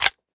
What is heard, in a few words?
rckt empty